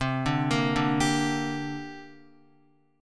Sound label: C 7th Suspended 2nd, Guitar; C7sus2 arpeggiated; JGuitar